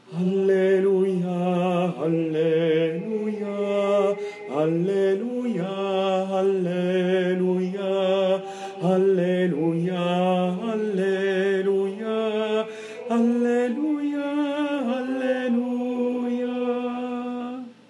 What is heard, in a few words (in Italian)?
alleluia tenori